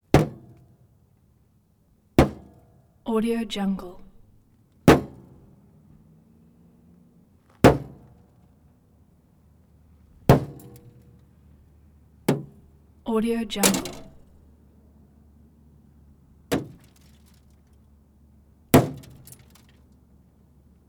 vehicle body impacts hit car pointed hammer some debris